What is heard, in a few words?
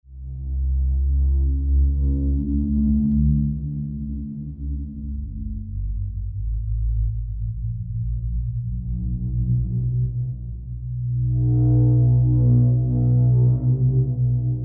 Stings Textures Rumbles FX; Natural Forces